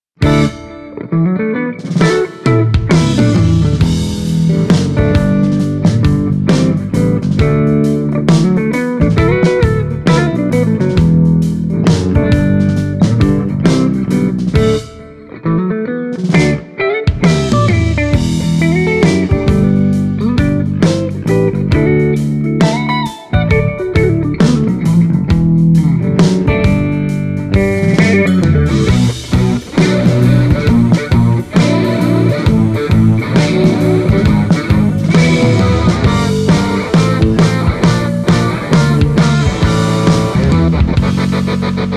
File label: Celestion Vintage 30 Les Paul